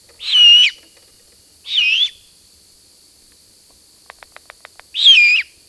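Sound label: owl GH snapping, great horned owl snapping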